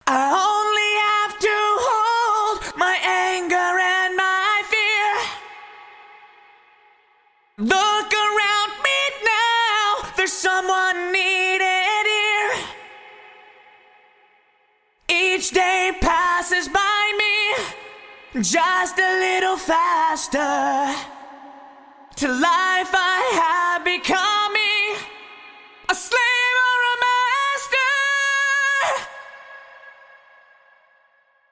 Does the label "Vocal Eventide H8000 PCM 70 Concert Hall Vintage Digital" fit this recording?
Yes